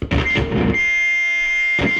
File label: feedback sfx